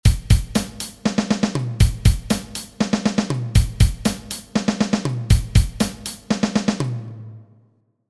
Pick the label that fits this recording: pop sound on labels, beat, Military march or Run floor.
beat